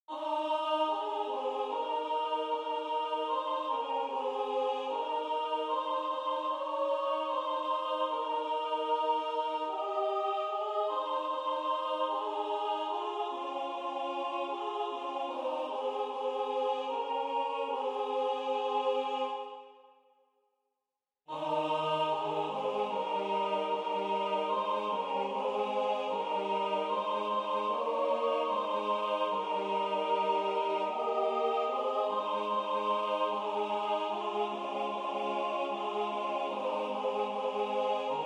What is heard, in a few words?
choir; O For A Thousand Tongues; Choir Music